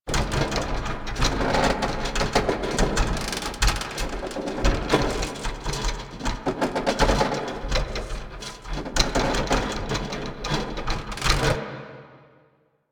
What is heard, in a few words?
Asteroid Winch Machine Shake Loop; Warframe; Ambience; Grineer Asteroid